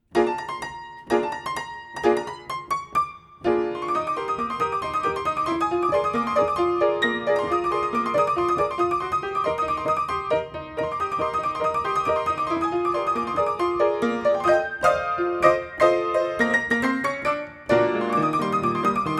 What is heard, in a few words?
Upright Steinway